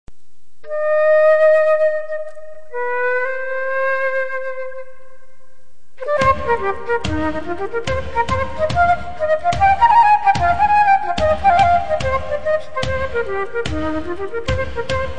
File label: Irish song